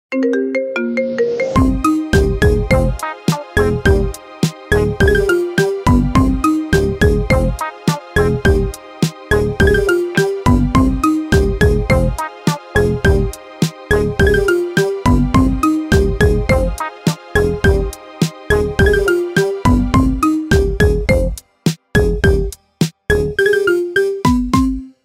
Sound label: Marimba